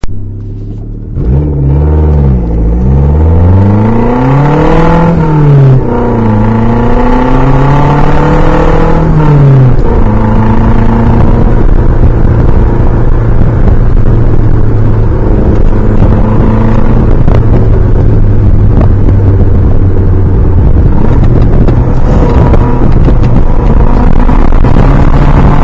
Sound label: Saab Sound